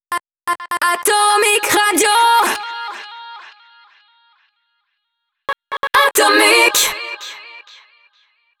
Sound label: JINGLE acap; ATOMIC; HABILLAGE ACAP